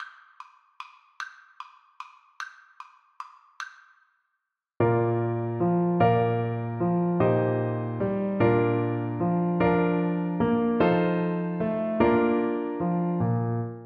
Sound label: French Horn